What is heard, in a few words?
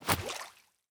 jump water; Opsive; UltimateCharacterController